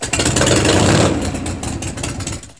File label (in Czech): auto